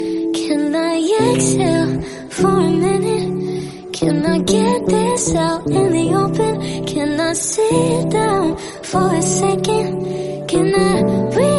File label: english song tone ringtone